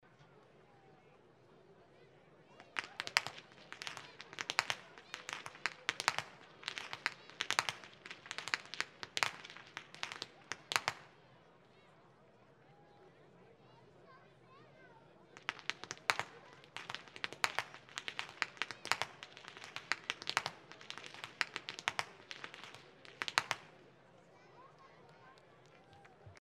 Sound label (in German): schnalzen, jugend